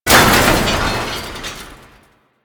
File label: grid door destructible; ambience; Warframe; Entrati